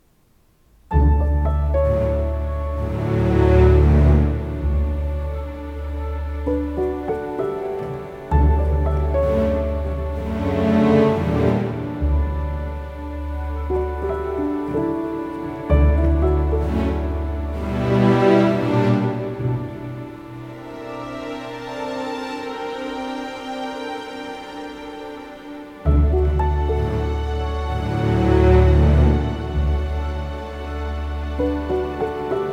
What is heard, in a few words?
Romantic